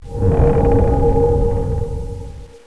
bark skin target; warcraft3

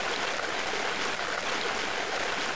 water run

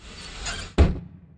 bank doors move

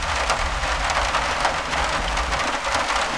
rain metal; metall

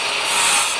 chainsaw; deathrun random

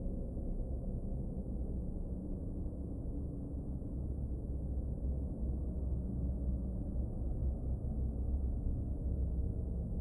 loop; hg industrialzone

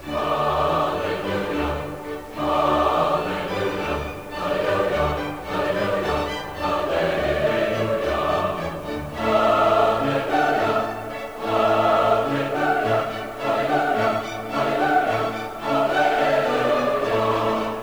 hallelujah

